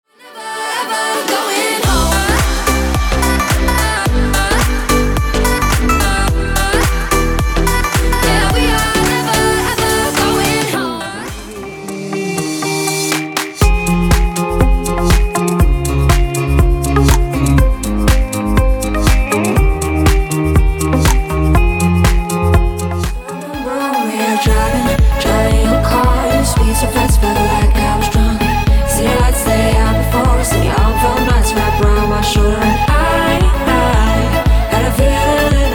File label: tropical